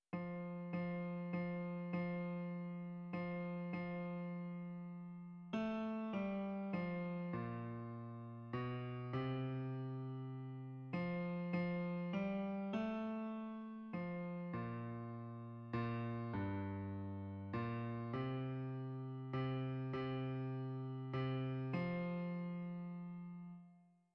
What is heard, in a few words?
Bass